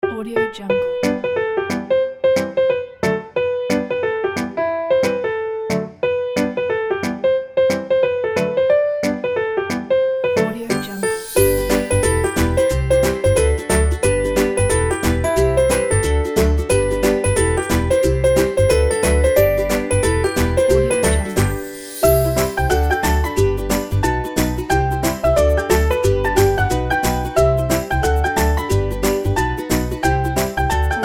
happy summer

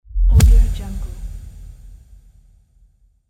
impact transition

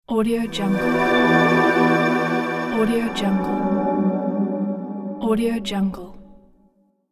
intro logo

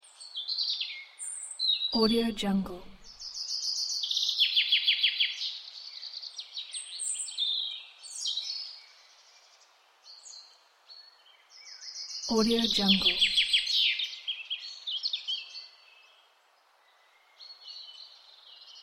birds singing in forest

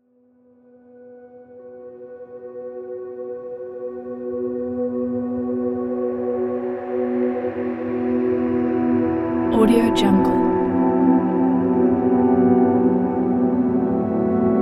lonely moonlight

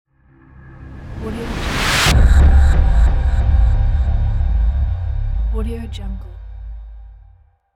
rising cinematic suspense stab; applehillstudios

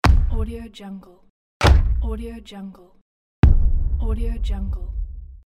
impact; tibasfx

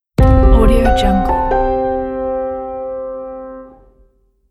piano impact logo

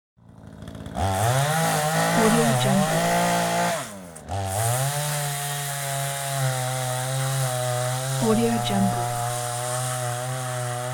sawing down tree

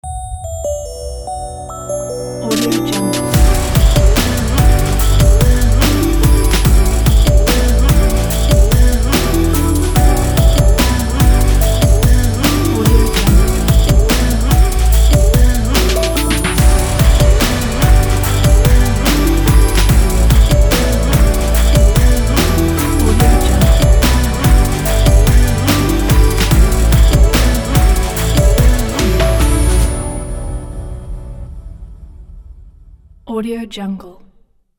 modern hip hop opener logo

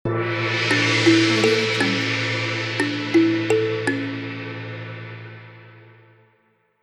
short yoga meditation logo

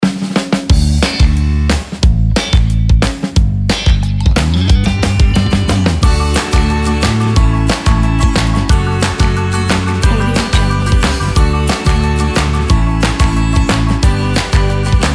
energetic upbeat indie rock opener

